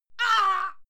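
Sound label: female fight pain, screams; schreie; menschen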